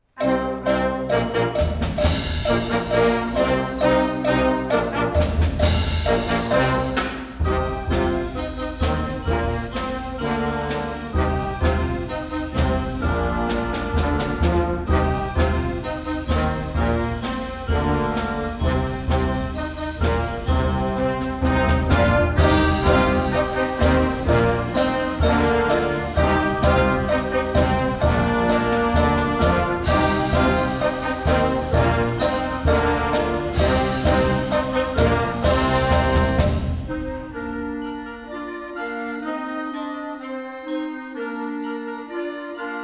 groove; wingert jones music

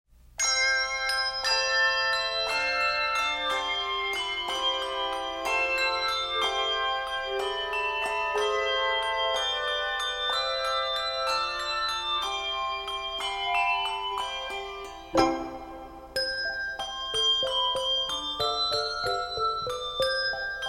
Handbells, Handbell Music; Bring A Torch Jeannette Isabelle; Publisher: Jubilate Music; Composer: Handel, George Frideric

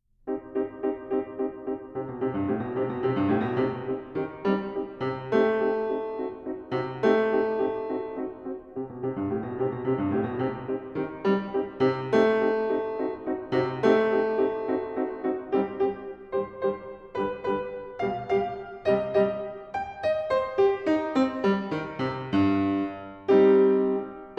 Ballade, piano

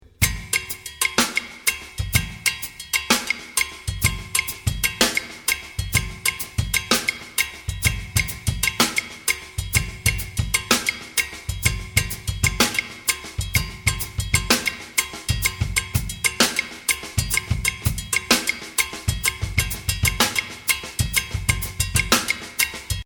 Afro With Backbeat Section; Warner Bros Publications

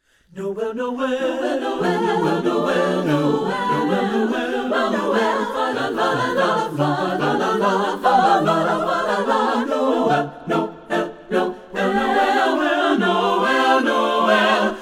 french chorale of praise; excelcia music publishing

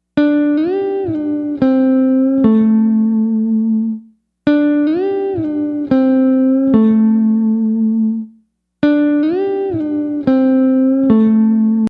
Bending Technique, Blues Guitar; Blues Guitar Methods

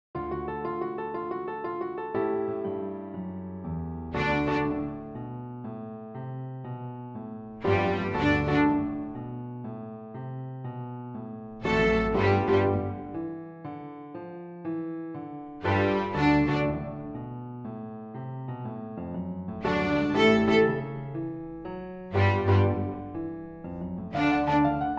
jazz for the open strings; wingert jones music

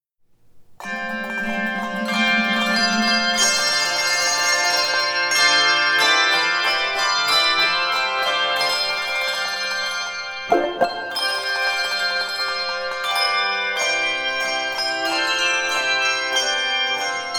Handbells; Publisher: Chorister's Guild

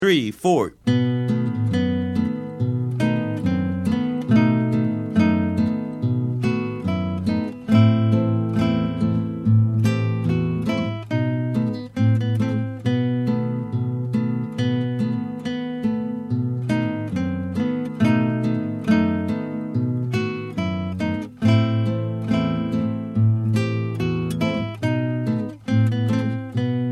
Hava Nagila, guitar

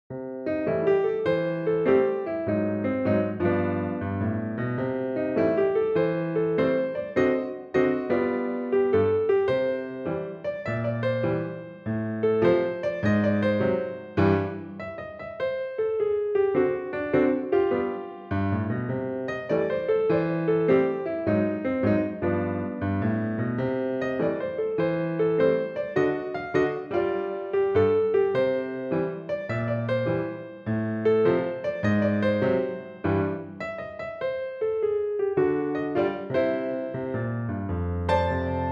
Happy Time Jazz, piano

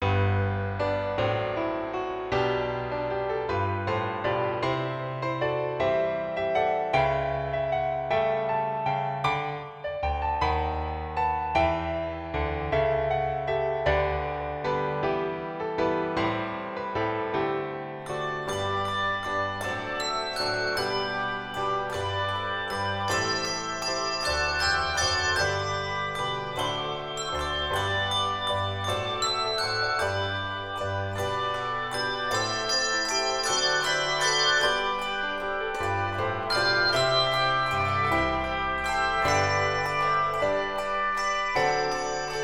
Handbells 3-5 Octave, Handbell Part

